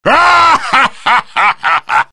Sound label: laugh